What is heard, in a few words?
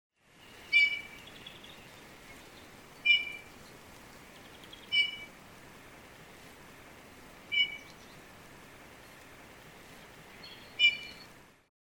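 Eurasian bullfinch voice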